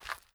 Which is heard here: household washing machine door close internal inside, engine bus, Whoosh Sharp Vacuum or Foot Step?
Foot Step